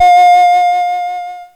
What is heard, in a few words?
casio beep